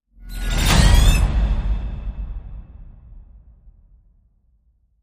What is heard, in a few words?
Harpy Swoop Whoosh Hit